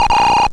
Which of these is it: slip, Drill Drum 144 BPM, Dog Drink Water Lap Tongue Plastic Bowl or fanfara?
slip